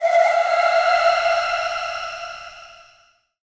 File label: Attack Fire; portal quest; android; Dungeoncorn